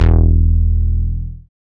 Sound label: BASS, Synth Bass; BA1 Synth-Basses; Sound & Vision - Gigapack I CD 2